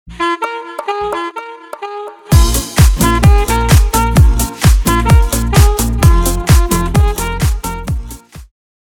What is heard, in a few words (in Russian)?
klassnyj rington s saksofonom